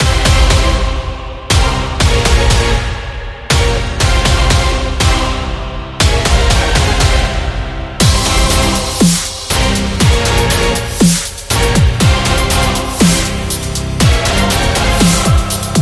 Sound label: Epic Sport